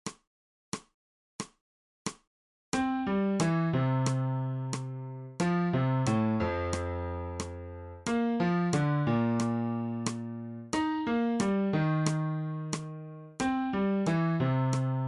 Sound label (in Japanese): 新フレットレス